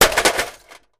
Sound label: Vcr Hit Sledge Hammer; electronics